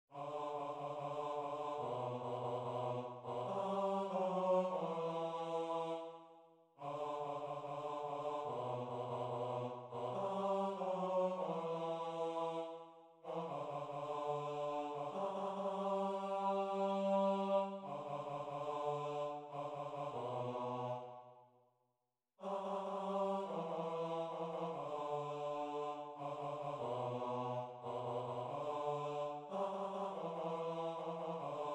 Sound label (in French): Basse